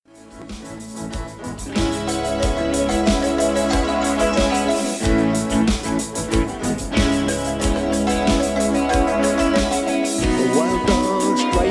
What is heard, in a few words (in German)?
ton africa laut; Live-Mitschnitte